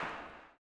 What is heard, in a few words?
CLAP, Blues Soul; Spectrasonics Vocal Planet